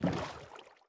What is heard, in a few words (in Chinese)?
船在水中划桨声